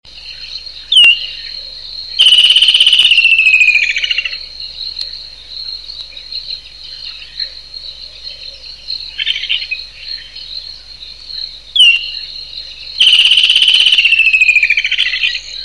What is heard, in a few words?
woodland kingfisher